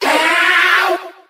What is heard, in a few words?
ow SUNG, Voice